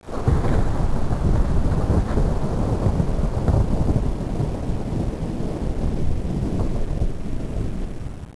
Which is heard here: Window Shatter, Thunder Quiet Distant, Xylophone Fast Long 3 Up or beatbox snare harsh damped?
Thunder Quiet Distant